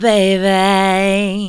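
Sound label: "baby", female vocals, left; PlatinumPhattCD2; E-MU Producer Series Vol. 8 – Platinum Phatt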